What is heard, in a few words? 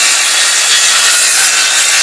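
Saw Spin Loop; smite; god bakasura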